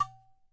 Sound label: udu FINGER, Udu Clay Hand Drum; ETHNIC and WORLD PERCUSSION